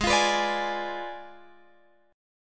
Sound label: G sharp Diminished 7th G sharp, Guitar Open F; G#dim7 strummed